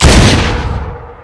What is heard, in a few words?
deagle; valorant weaponsound